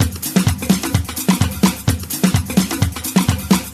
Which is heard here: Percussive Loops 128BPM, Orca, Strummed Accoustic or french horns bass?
Percussive Loops 128BPM